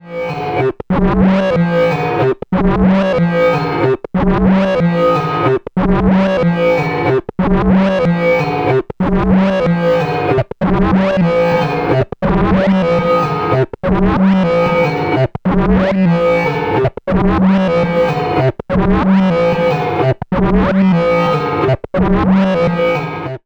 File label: Korg volca modular Motion Sequence